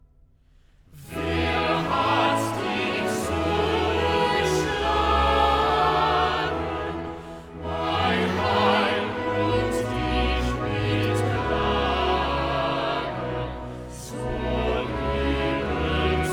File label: Choral; Wer hat dich so geschlagen; Bach Matthew Passion